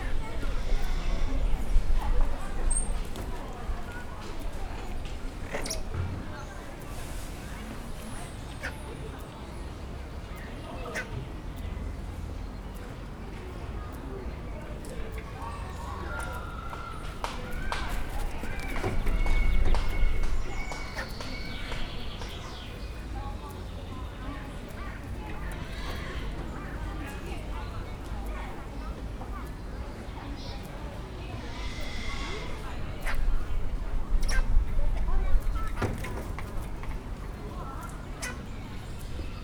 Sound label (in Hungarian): halkuló emberek, mókusmajom; pecsi zoo 2017